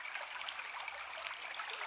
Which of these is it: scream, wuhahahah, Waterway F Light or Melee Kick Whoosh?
Waterway F Light